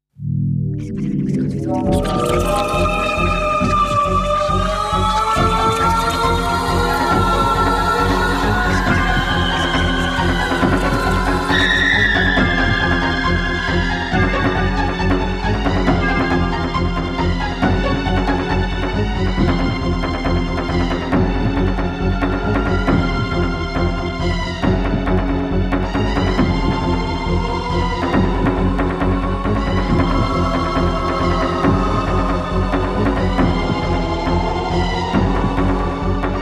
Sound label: Demon Music